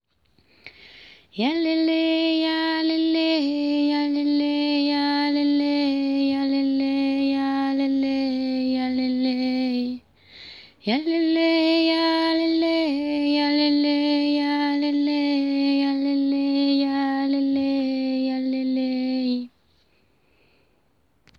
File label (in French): Voix intermediaire descente